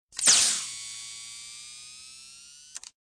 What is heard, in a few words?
hypospray, medical devices